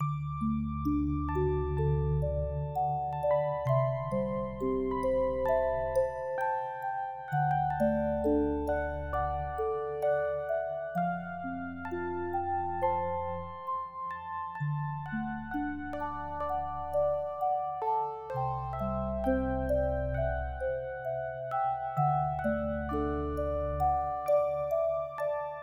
a trot through the forest, A charming accompaniment to peaceful scenery.